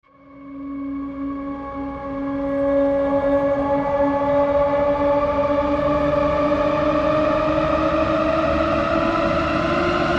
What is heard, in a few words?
Crystal Rise